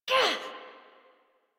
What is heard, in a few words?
Pain, Adult; Warframe; New War; Adult Operator